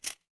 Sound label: office cash register